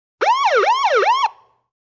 Siren Yelper Short

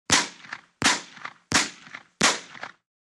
44 Cal Pistol 4 Shots; exterior

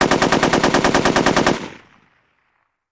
Machine Gun Sngl Rap, single burst

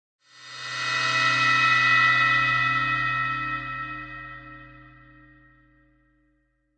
Feedback Electric

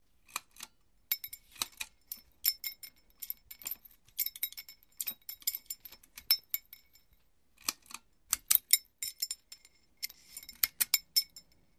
Pull Chain Sequence 2

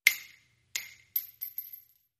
Shell Case Sngle Tube, shell casing movement

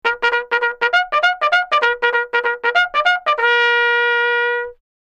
Bugle Charge Single B, bugle civil war

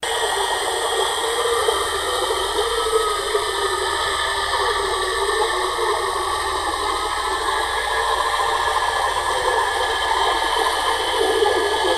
Torpedo Jet By, torpedos

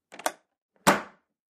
door deadbolt

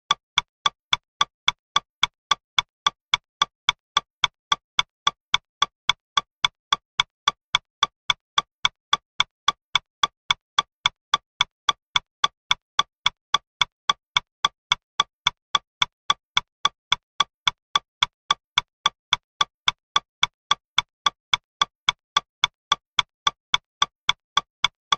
Timer Steady Clicks

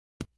Golf Club Hit Trap

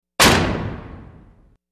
Industrial Oven Door

